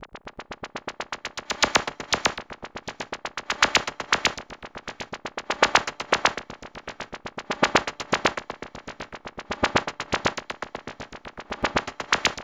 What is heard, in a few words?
HIQ BEATS; Zero G; Malice In Wonderland